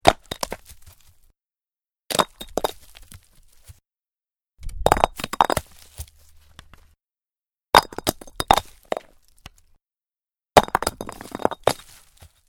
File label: Rock Fall And Tumble On Rocky Surface; A rock impacts with other rocks and tumbles. 5 separate recor